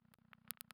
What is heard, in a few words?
crackles, Vinyl